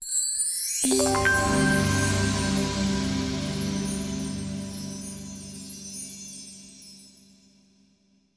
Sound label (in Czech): sms zvuk